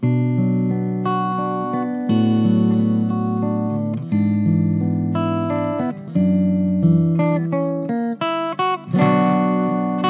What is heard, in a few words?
laroqua 212 studio ballad closed